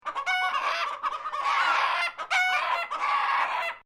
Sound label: Bird Chickens Japanese Squwarking Long, Chickens from Northern Japan; Birds; Animals